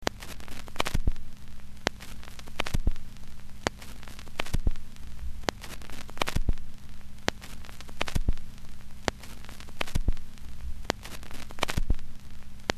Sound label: Record Player Static; Mike Koenig